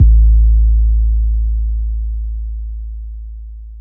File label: 808s